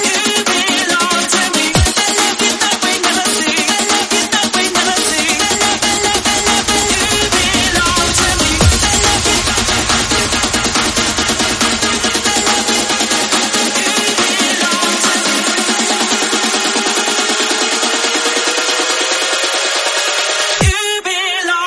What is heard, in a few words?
140bpm Ebm